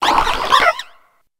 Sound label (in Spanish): Grito de Toedscool, Pokémon